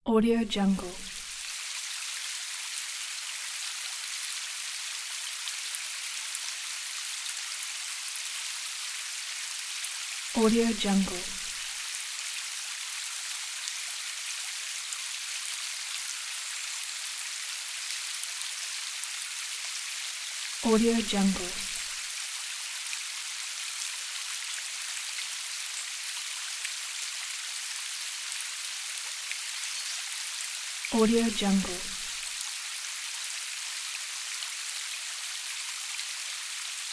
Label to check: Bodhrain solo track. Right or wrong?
Wrong